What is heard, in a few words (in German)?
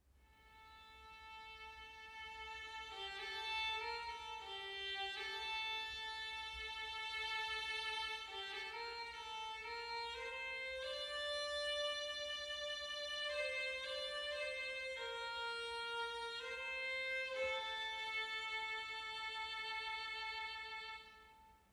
Musikaufnahme Shure MV88 Mono Achtercharakteristik